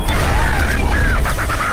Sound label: laugh; lil hunter